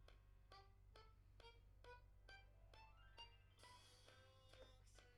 Accordeon

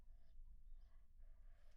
Children Choir; Studio Bekol Children Choir

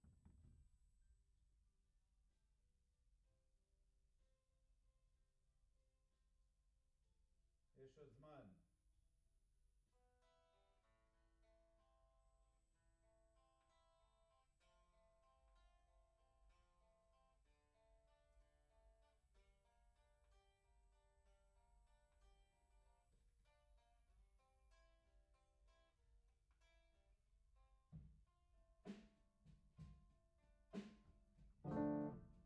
Piano A

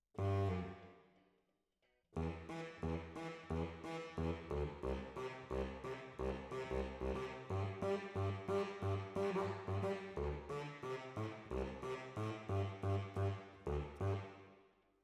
Alto, RNR for piano

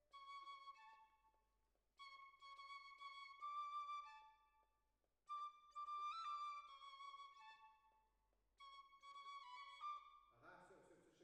Clarinet

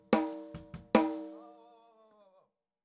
Snare Top

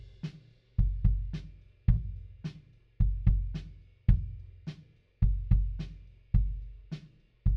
Kick In

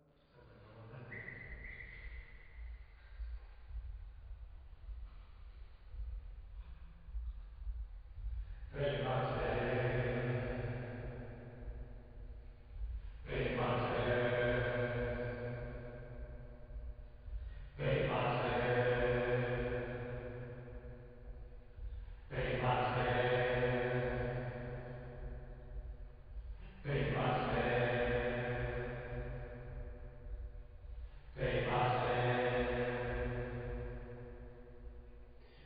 Choir

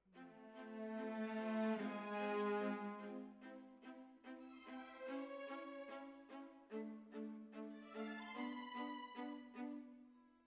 Cellos Over

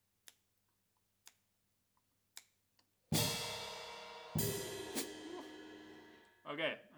Ride

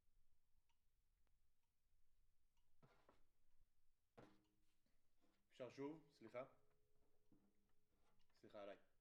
Kit, 96bpm

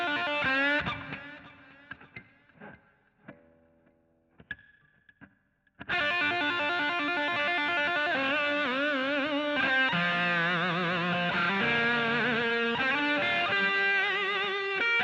GTR A Solo

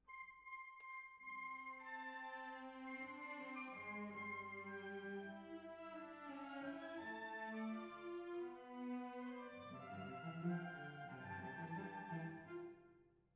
Cbasses

